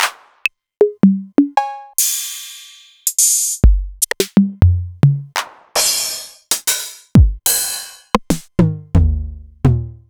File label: Novation Drumstation; DrumstationV1